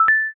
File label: notify message